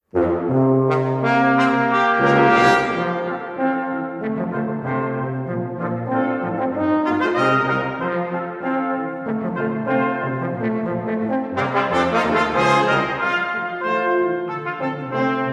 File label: Concertino Vivo